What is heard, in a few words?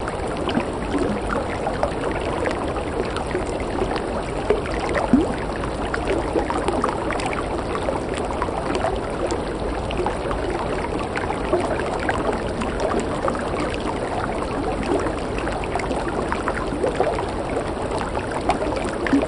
Sml Babbling River